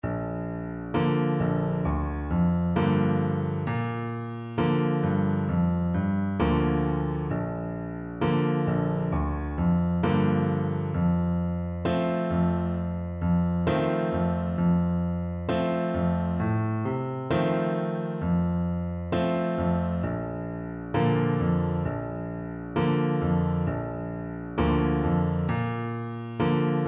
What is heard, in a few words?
trumpet